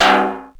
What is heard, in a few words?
Metal, EMU E-Drum